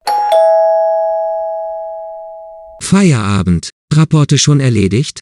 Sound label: dingdong